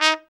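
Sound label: BRA TRUMP, trumpet, brass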